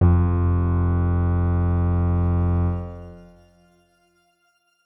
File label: Grain F1 pp; healing soundscapes; HSS Ableton Pack1; X Grain